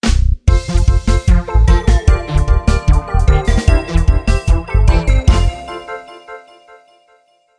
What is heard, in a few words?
Jingle Win; equilibrio; wiiware